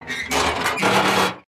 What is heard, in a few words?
Metal squeaking from movement